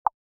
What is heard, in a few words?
message pop alert; ntfy; mixkit